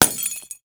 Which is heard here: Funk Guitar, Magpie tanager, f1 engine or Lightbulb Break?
Lightbulb Break